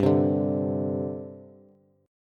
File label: G Suspended 4th, Bass; Gsus4 strummed; JGuitar